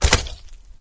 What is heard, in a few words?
jump, flood